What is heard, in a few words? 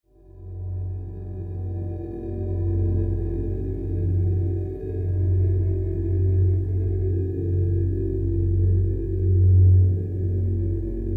Spaceship, Spaceship: Ambience; Spacecrafts; Stuart Duffield